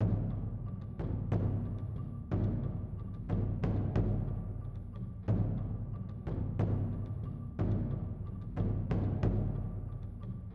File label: Taiko; Samurai Riot